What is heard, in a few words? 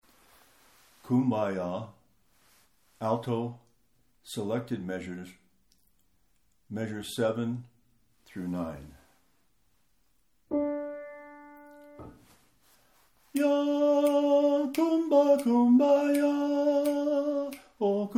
Kumbaya Rythym Alto